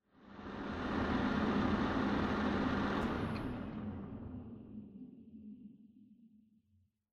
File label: PC Operating Noise Forced Shutdown